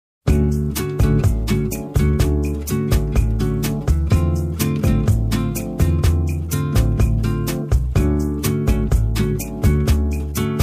Bossa Nova

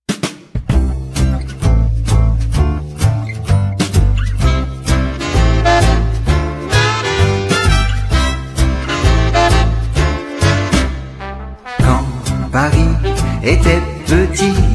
french

